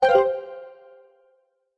Map Close; Open and close the Map; Skyward Sword; zelda

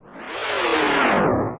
Shake; Morpha

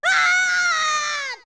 Fall; Link's screams while falling

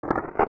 Walk; Dead Hand; OOT

